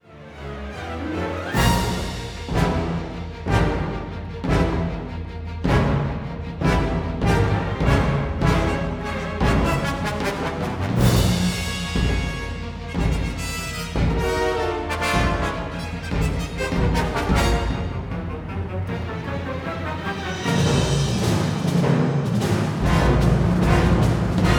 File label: Tension Loop